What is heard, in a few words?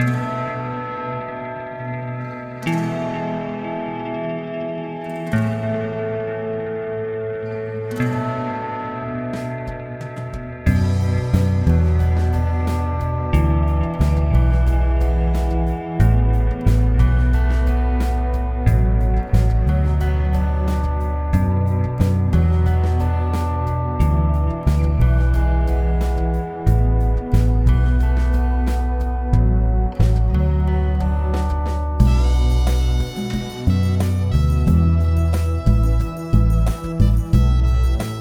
deep ocean instr